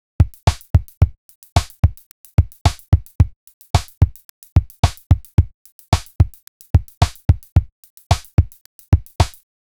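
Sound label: kickless drum loop; accent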